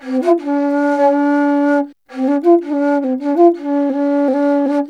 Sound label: INDIA ALTO; Spectrasonics; Heart of Asia; IND ALTO PH